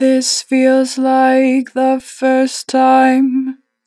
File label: Natural Vibrato, Vocals, First time, 2 bar vib; Harmor Vocals